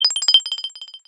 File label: sms short